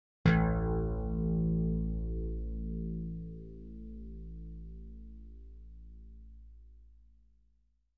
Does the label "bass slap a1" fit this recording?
Yes